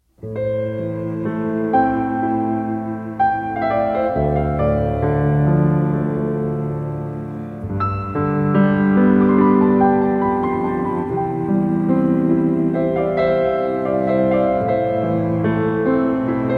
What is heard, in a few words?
piano cello